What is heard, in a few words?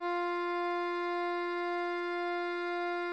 instrument note